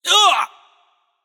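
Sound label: nonverbal ouch; smite; voicepack; merlin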